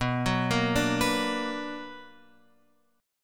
B Minor Major 7th, Guitar, Open F; arpeggiated; JGuitar

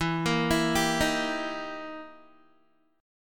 E Minor Major 7th Flat 5th, E, down, Guitar; EmM7b5; arpeggiated

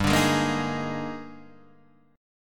D sharp Major 11th, G, guitar chord

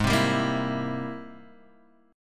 Ab Augmented Major 9th Ab Guitar DADGAD

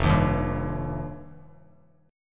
F 7th Suspended 2nd, Bass Standard; F7sus2; strummed; JGuitar

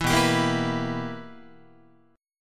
B Major 7th Suspended 4th Sharp 5th Guitar, chord; BM7sus4#5; strummed

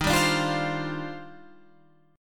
Eb Major 13th, Guitar, Standard; EbM13; strummed; JGuitar

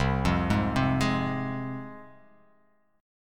C Suspended 4th Sharp 5th, C, down, chord; Csus4#5 arpeggiated; JGuitar

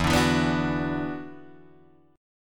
E 7th Suspended 2nd, Guitar, Drop D; chord shape

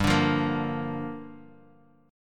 G Minor 7th; Gm7; strummed; JGuitar

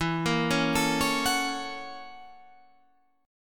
Gb 7th Sharp 9th E, guitar

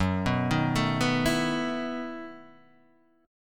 E Major 7th Suspended 2nd Suspended 4th Gb

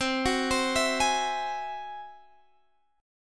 G sharp Augmented 7th C

